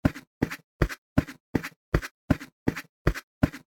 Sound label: walk tatami